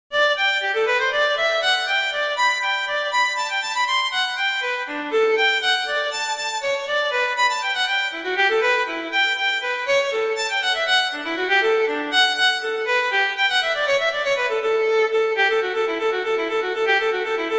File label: Gigue; Bach; Suite 3